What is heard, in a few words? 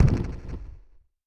Wet Percussion (Hybrid)